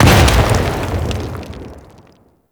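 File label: Mortar Projectile Explosion; Warframe; Venus; Venus Terra Mortar Moa; Abilities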